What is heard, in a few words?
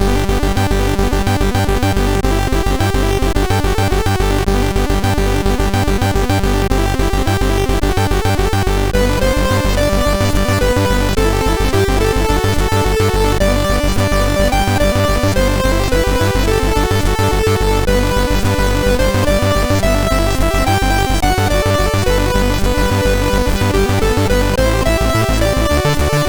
Minimal, SID MOS6581R2